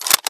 k98 bolt forward, weapons

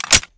button click release

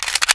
p38 clip in

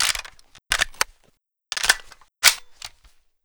mp40 world reload

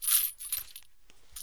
mg42 bullet chain, weapons

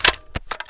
k98 clip in

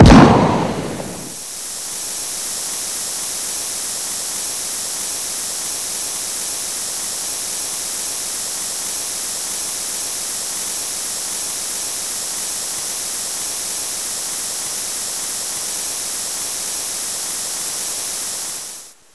explode smoke

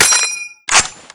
garand reload; weapons

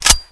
mg42 bolt forward

